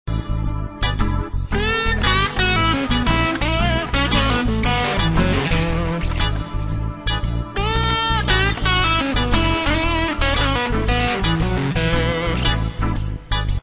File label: blues